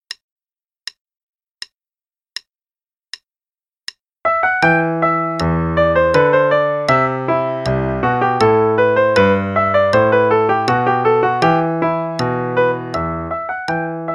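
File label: Play-along, Hornpipe, quarter note=80; Purcell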